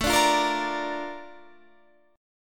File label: Bb Major A, chord; Bb/A; strummed